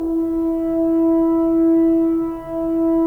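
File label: Solo French Horn, Brass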